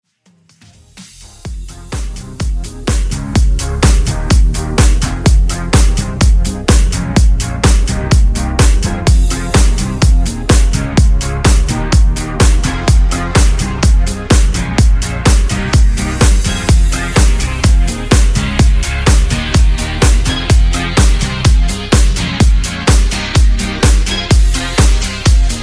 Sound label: 80s Tech House